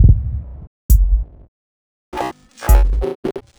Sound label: Crunchy, Beats; Sonic Foundry (Sony Creative Software)